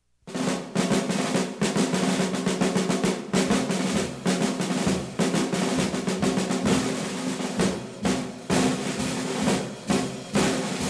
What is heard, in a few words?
blues march; B-flat